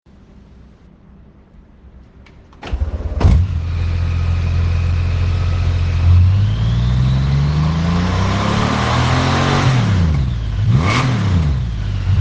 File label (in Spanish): Sonido Goldwing